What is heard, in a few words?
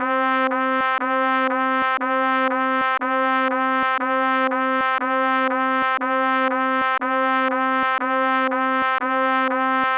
Horn and Trumpet Repeating Middle C Notes